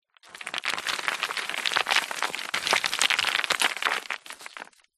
foley stones rocks small landslide fall down; zapsplat

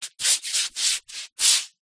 foley feet scuff shuffle on exercise pad mat; zapsplat

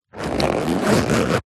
pouch small zipper zip; zapsplat; foley

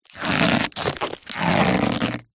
foley rubber thin elastic stretch stress; zapsplat

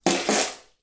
human spit bath water from mouth

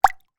small amount of water in drinking glass movement

